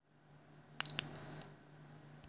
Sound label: sound snap remote